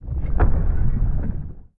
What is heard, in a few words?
Die